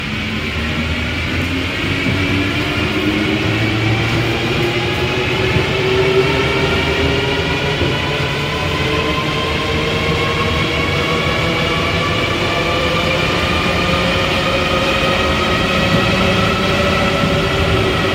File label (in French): acceleration, Metro